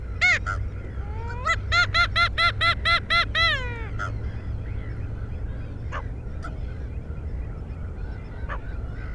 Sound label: Slender-billed Gull